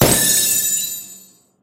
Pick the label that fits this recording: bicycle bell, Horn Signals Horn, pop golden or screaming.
pop golden